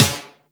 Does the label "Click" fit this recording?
No